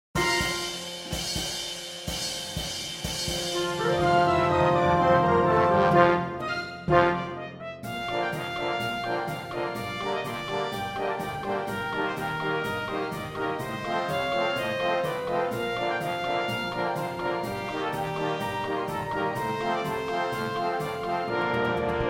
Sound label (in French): Concertino pour trompette Circus Comedy; Concertino pour trompette (09 - Circus Comedy)